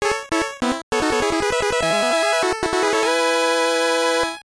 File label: Jingle